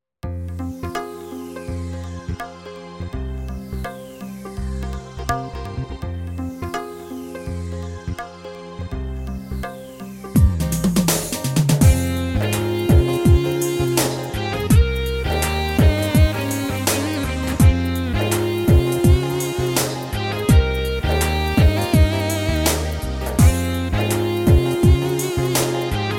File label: Groove Slow